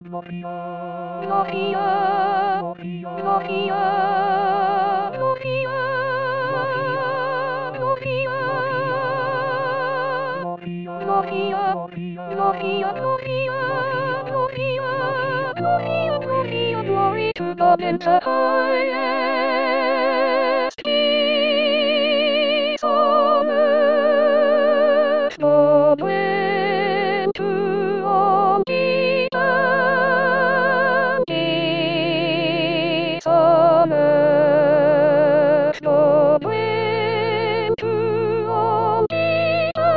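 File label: Christmas Fanfare, soprano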